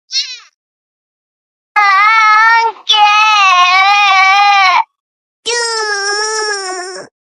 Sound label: crying